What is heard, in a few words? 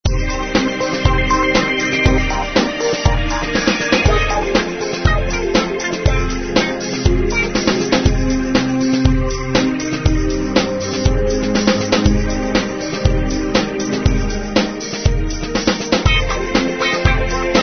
clasic royalty free music musica